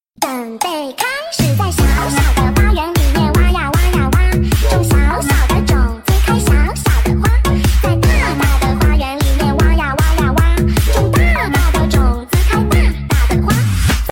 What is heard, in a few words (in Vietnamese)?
nhac trung remix; tiktok